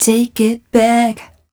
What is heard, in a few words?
f mel e flat, fem Vocals